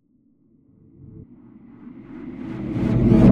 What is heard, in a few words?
stinger echos of time; Sonniss GDC